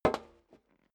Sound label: step dress boots metal dry single right; schritte; Footsteps; menschen